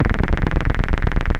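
monotron delay - B - 96, Loudest frequency 464 Hz Recorded with monotron delay and monotron - analogue ribbon synth; monodelay; synth loops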